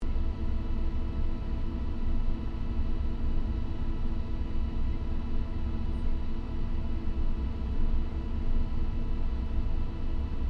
AIRCRAFT LOCKHEED L-1049 SUPER CONSELLATION TAKEOFF INTERIOR; Connie, Super Constellation; 1955; Airplanes